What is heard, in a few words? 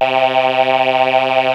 C 605 CHOR, Yamaha C605; TECHNO-BWARE